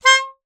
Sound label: Melodica Short Note